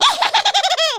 laugh, German; speech

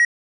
MINE TICK; Worms3D

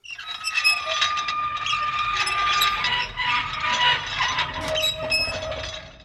Old Robot

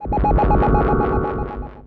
Teleport; Worms3D

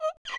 Walk; Worms3D

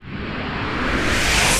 ROCKET POWER UP; Worms3D

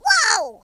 oof, American Wrestler; speech

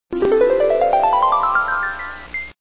Bell

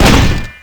broke armor

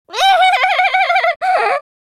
loseloop

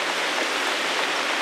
Hose Into Water

